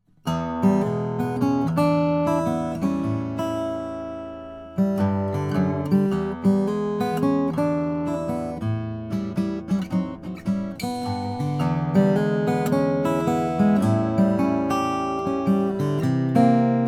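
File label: froggy bottom r 12 deluxe all mahogany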